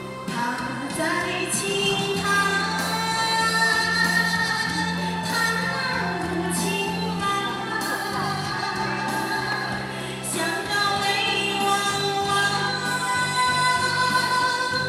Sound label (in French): Chanson dans le Palais D Eté; Chine